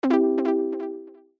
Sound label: lose; wbg games stable; risk coin